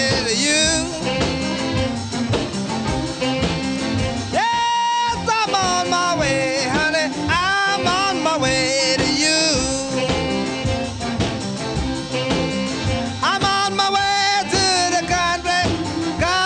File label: blues